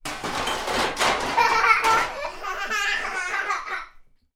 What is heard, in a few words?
human 2 x children 1 year old and 3 year old play with pots and pans